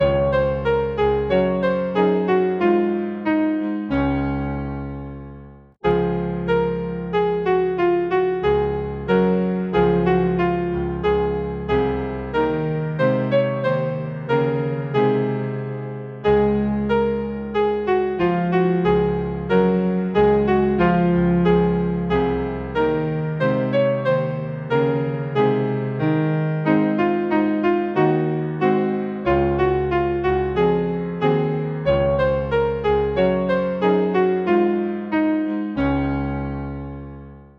Hymn, piano, Savior, like a shepherd lead us, Sicilian Mariners